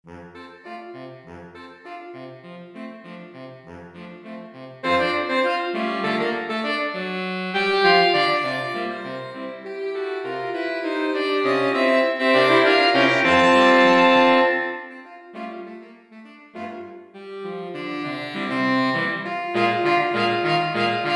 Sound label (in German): saxophonquintett